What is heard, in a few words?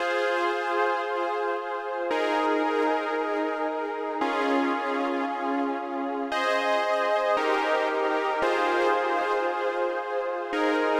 114 BPM F Min Chords; Retro Collection